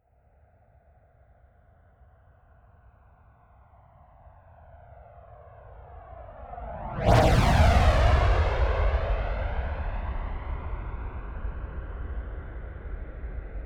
military jet flanker-c turbine flyby left to right close